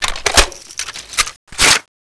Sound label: saw reload; svencoop